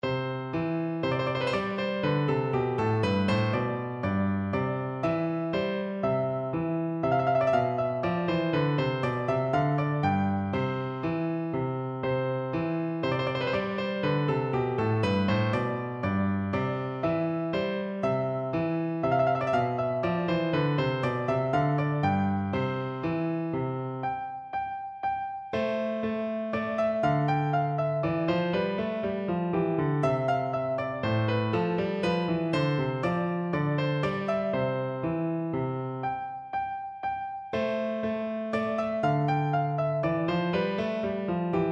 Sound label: German Dance, piano; K605